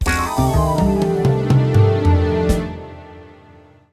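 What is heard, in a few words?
Jingle Fail; Pilotwings Resort; 3DS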